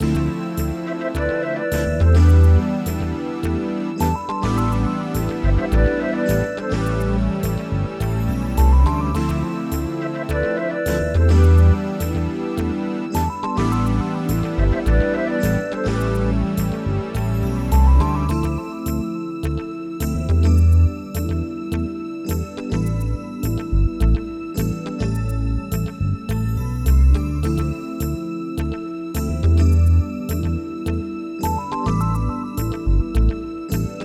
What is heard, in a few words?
105 BPM A Min, Melody Loops, Retro Collection; Daylight